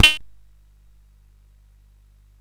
SFX; Narco Police

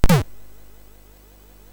Bomb Chase Revival SFX, sid MOS6581

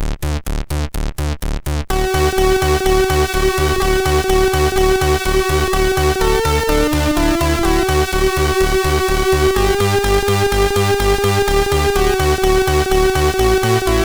Jingle Bells, sid MOS6581R3

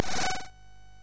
Project Firestart, MOS6581R4

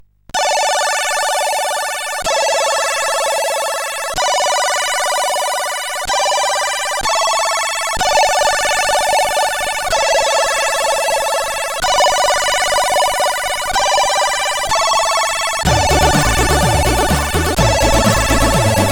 Gametune, CSG8580R5; Doussis Stello

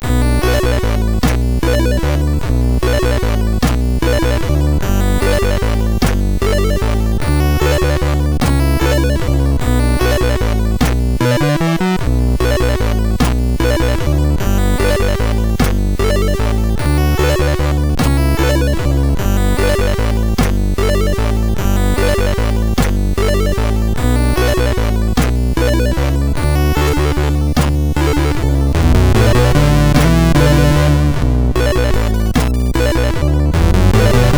Waltz Blues, MOS6581; MOS6581R2

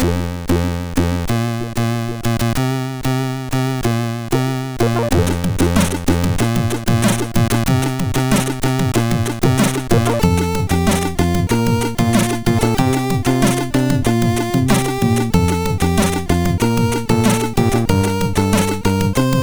Lame Over tune, MOS6581R4; Lame Over tune 13

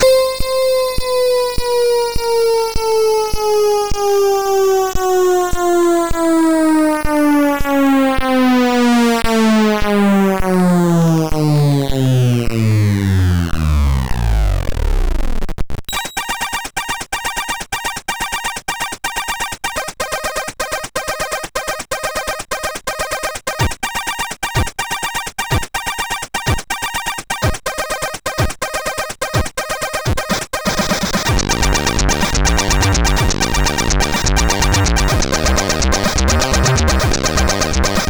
sid CSG8580R5

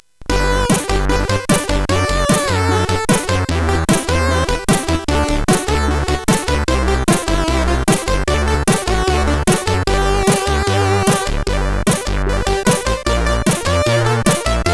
Congrats, sid CSG8580R5; hvsc

